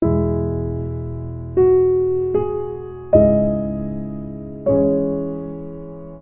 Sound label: piano